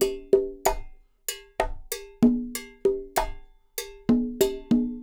Percussionworks; Sampleheads; NYC Percussionworks; 90 s Sample CDs; LOOPS 96

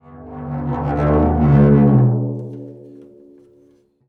Prepared Drums

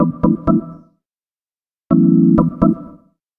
lop 126bpm, House Instr and Stab Loops

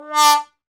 BONE, Trombone; Solo Brass

